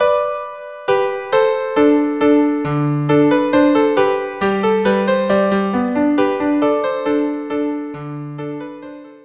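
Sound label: minuet viola viola duo; KV 487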